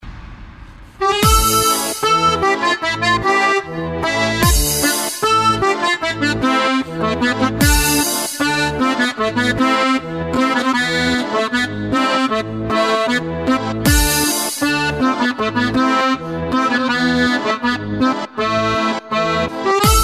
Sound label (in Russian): yablochko bayan miks akordeon